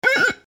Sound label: Finger Squeak, Finger Squeak On Ceramic Plate; Wet Finger Squeak On Ceramic Plate , Quick; Squeaks